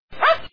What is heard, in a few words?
wau